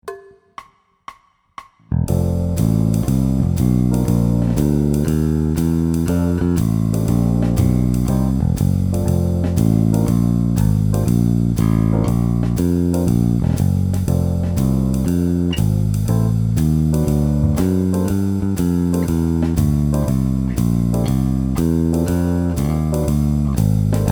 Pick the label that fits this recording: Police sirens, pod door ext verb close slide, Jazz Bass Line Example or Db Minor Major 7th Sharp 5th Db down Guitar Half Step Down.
Jazz Bass Line Example